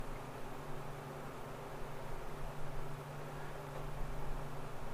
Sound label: 2nd Floor Museum EVP